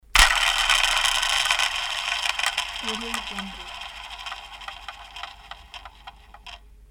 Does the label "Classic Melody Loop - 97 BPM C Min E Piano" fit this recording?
No